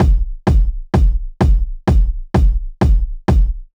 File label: 128 Sweaty Kick